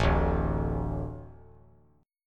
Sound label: D 5th D, down; D5 strummed; JGuitar